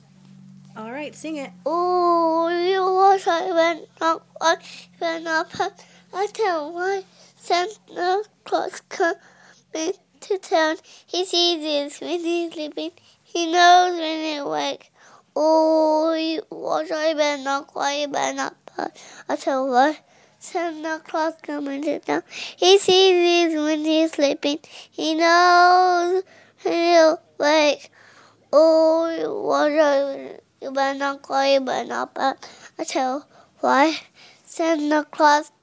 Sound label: singing xmas song